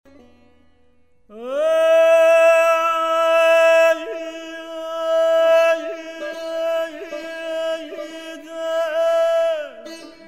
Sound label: Oceanic Chant